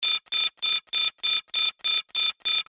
Futuristic alarm imminent threat or warning loopable